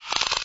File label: Rattle